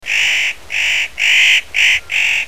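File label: hosigarasu, spotted nutcracker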